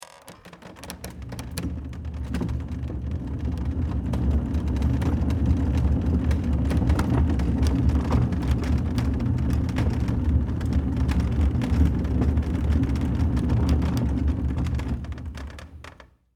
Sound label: Wood Work Table, Roll, Push, Wheels, Long; Wood Sound Effects; SoundEffects-BlastWave